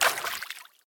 sprinkle water

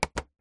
mobile phone put

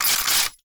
gun gird

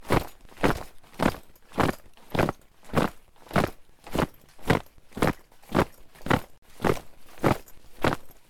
walk army

